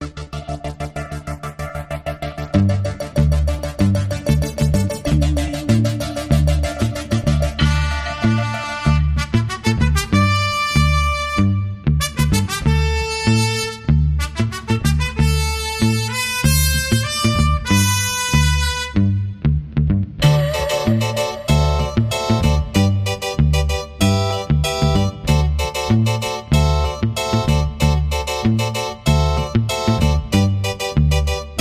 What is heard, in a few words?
March, No Drums